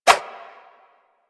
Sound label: Spheres Throw Whoosh; DE; Warframe; Weapons; Solstice Spheres